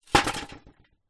household bucket metal old rusty set down concrete; zapsplat